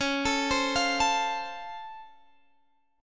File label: C sharp Augmented Major 7th down Guitar Drop D, chord